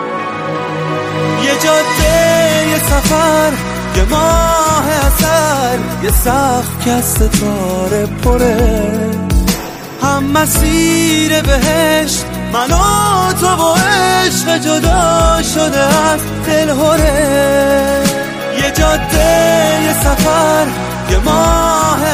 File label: Honeymoon Ringtone